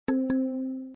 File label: notification